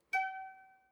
guitar clink single

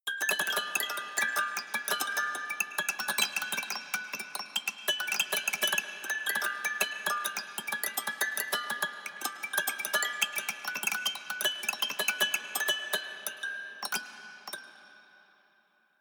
haunted strings tinkle sequence

